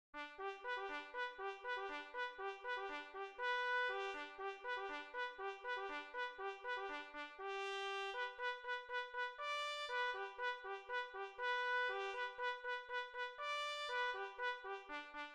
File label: reveille